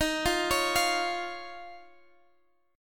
Eb 7th Suspended 2nd Eb, Guitar Standard; arpeggiated; chord

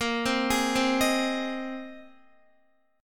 A sharp 7th Suspended 2nd Suspended 4th, Guitar; arpeggiated; chordshape; x,13,10,13,12,10

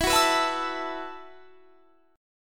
E Suspended 2nd Suspended 4th; Esus2sus4; strummed; 24,21,21,21,22,24

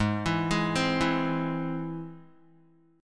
Ab Augmented Ab Guitar; Ab+ arpeggiated; JGuitar

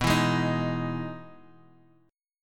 E Major 7th B, chord

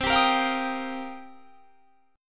C Minor 11th C down; Cm11 strummed

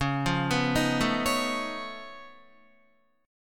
Db Augmented 9th Db down Guitar; arpeggiated; 4E,4A,5D,5G,5C,6F

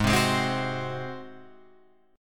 Ab 7th Ab down Guitar Open F; Ab7 strummed; JGuitar

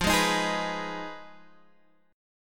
F Minor 7th Flat 5th

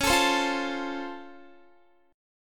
Db Augmented Major 7th, down, Guitar; strummed; JGuitar

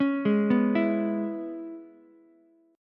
Db Minor 7th Db down Ukulele; arpeggiated

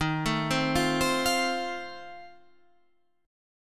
F Major, guitar chord; F/Eb arpeggiated

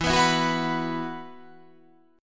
E Suspended 2nd

